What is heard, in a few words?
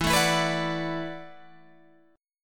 E 9th Suspended 4th E down Guitar Open G